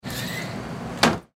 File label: Freezer Door Open, Freezer Door Slide Open Restaurant; Appliance; Industry; Household; Blastwave